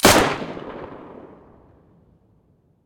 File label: mosin muzzle mono, weapons